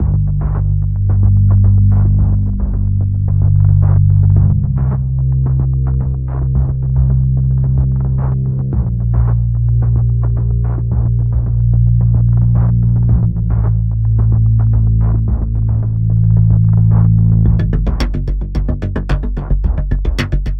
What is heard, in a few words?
Tense; Everspace 2